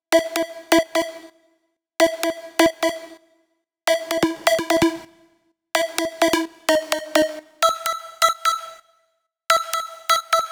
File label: synth note 3, Key E, Bpm 128, Big Room House; Loop Nerds; Big Room House Allstarz